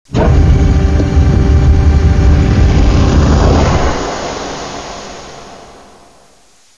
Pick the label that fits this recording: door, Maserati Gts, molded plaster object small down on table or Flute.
door